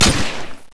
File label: galil; FAL